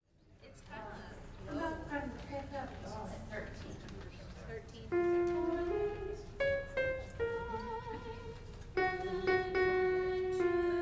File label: alto